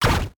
Multimedia Internet CD-Rom Flash Pop Up

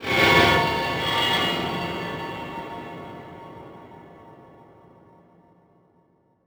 Transition Metallic Blast

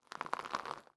Foley Tire Rolling Over Gravel

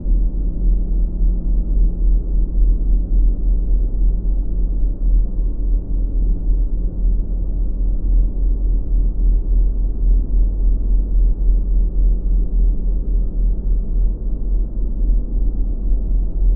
Drone Wind Deep Low Rumble Pounding; Production Element Imaging; AdobeCC

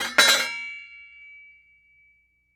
Industry Tool Spade Set Down On Boardwalk

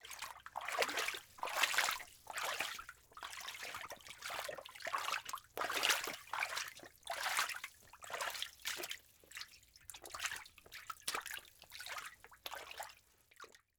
Liquid Water Water Footsteps Walking In Water

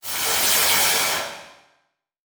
Title Transition Laser Long; Adobe; Production Element

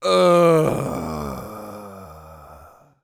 Human Male Moaning

